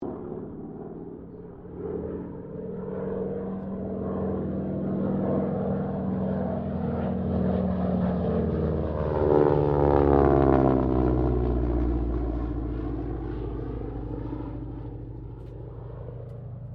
1942 AT-6D HARVARD MK IIB PASS, Harvard Training aircraft; Airplanes; Vehicles